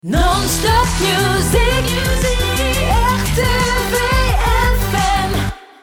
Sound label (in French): Jingle Chanté; RTVFM; chateaurenad